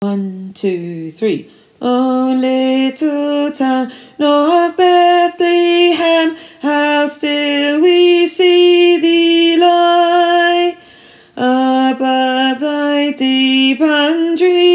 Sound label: Alto